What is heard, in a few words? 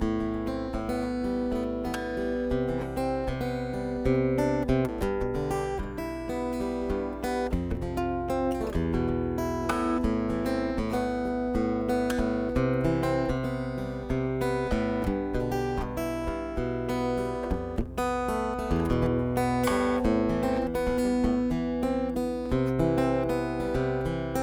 Fingerpick, Acoustic-Electric; Ashley